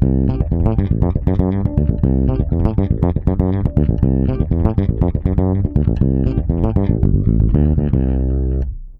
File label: C 120BPM, PEDULLA BASS; Masterbits CLIMAX 7